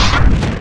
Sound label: RUN HIT; PC Gamer 37